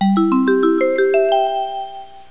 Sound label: movement